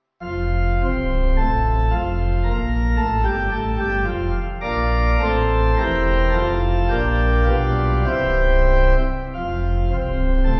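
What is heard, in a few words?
Pipe Organ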